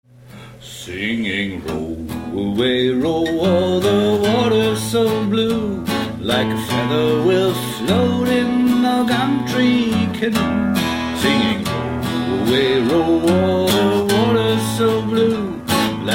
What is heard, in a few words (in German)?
gesang kurz